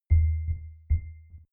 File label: button deep drum chink; maschinen; signale